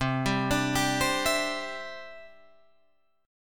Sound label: C Major Add 9th, Guitar Drop D